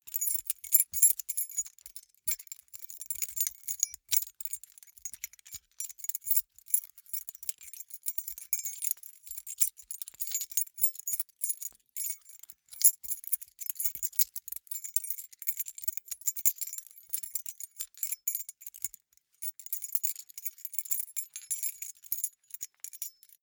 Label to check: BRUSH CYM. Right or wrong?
Wrong